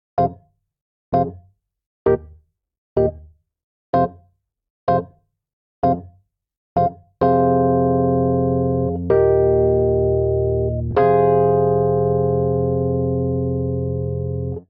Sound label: Progression Dub i - iv F Minor 64 bpm, Electric Keyboards; Ableton; Factory Packs